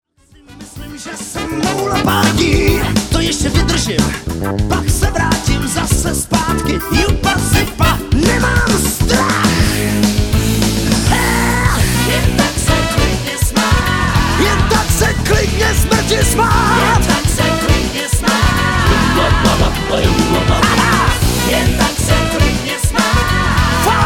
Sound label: No Guitars